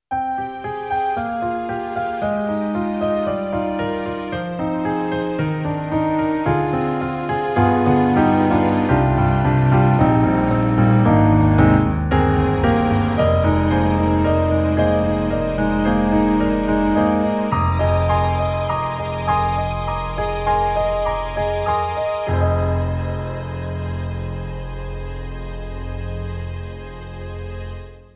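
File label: SD-80 Piano and Strings; EDIROL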